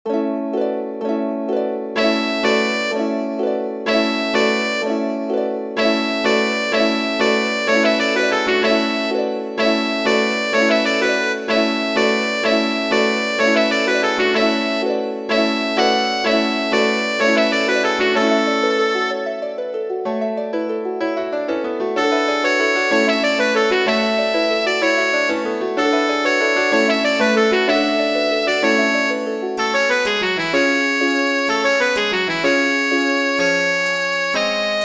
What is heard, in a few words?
sop1, midi; britten ceremony